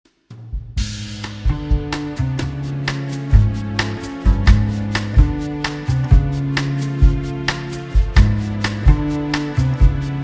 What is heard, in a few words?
bicycle ride, backing tracks